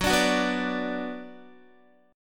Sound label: Gb Suspended 4th Sharp 5th Gb, down, Guitar; strummed